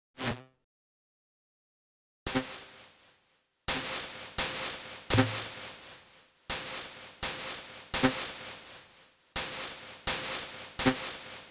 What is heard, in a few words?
Booktoss